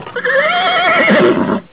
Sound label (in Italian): cavallo